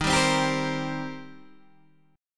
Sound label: D sharp 6th, D sharp, down, Guitar, Open D